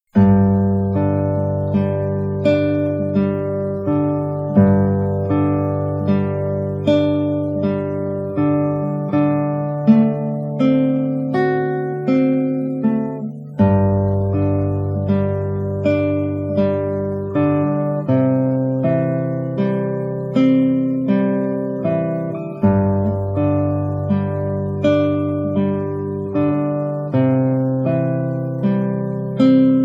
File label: silent Night guitar